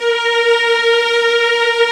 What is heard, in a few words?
A#3, st arco violas